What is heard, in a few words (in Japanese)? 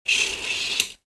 carten on